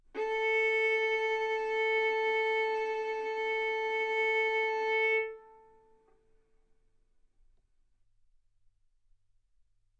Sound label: Violin arco mf sul A A4 B4 stereo